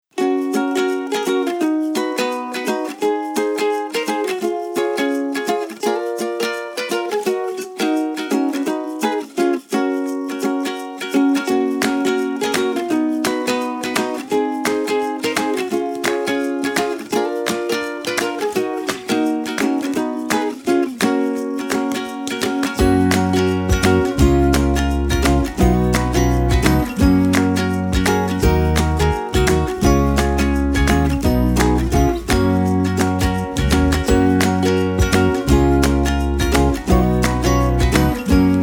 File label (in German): musik; Eiskratzkarte